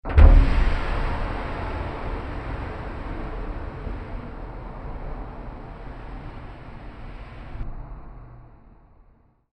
hydraulic door

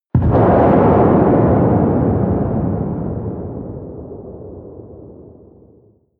missile large fire airplane distant

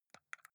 console controller xbox arrow key press slow

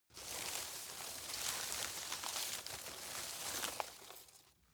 grab rustle medium

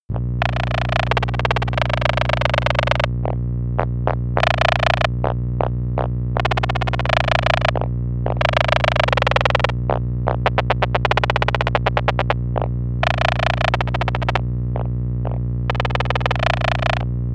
atmosphere glitchy hum

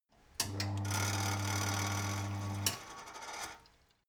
mechanic sink running short

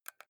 console controller xbox joystick press fast

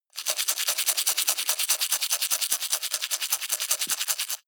teeth brush dry open circulate fast

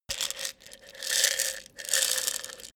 pills pouring

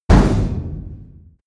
big metal impact short

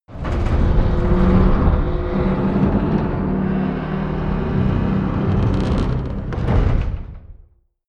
gate metal large open

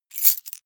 key ring large place hard

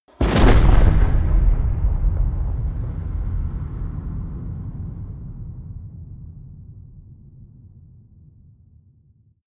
shotgun fire huge reverb bolt

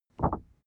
cardboard wobble short medium

